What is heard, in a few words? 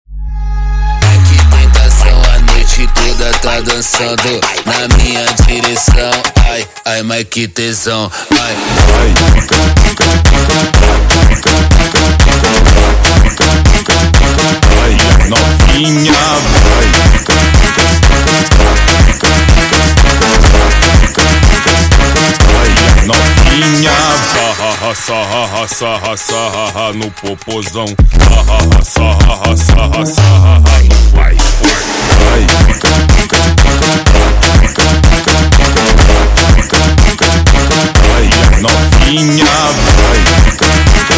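sped Up, phonk music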